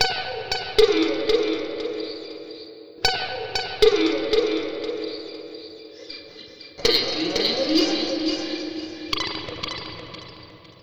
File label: Gtr C, Slo Mo Funk, Lounge; Zero-G Kocktail Kollection; Construction Kitz (Lounge)